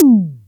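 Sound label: Hi synth tom, Roland MC-303 Percussion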